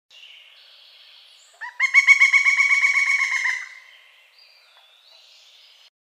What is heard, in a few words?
pileated woodpecker call